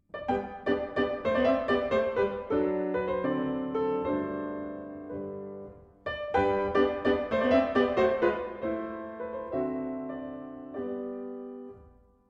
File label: Polka; Wagner